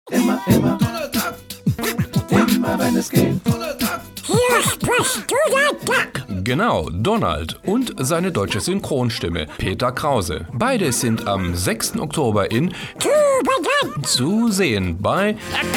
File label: Radio Jingle